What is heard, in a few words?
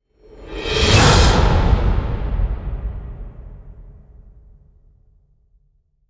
Death Slam; Gothic Storm Music; Brain Warp (Sound Design Trailer Tools)